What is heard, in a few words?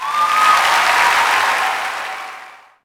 Cheer, Crowd, reactions